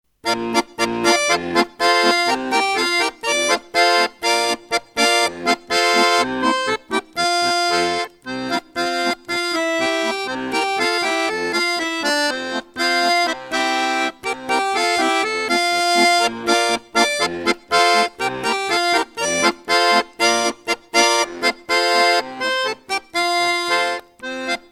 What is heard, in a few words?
Garmoshka